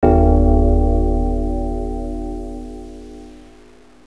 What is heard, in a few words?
BIG BEN; Windows